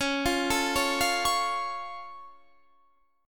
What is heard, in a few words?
C sharp Augmented down Guitar Standard, chord; arpeggiated; JGuitar